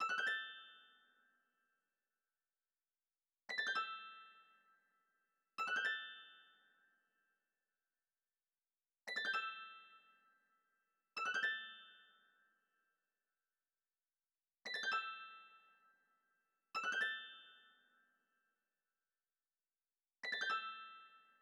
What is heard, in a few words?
86 BPM E Min Harp; Cymatics; Gems Free Teaser Pack; Cymatics Gems Vol 9; Dreamers